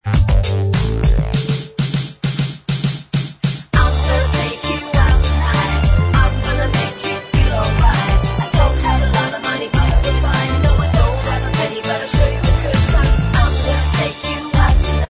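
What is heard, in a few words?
backing vocals track, backing tracks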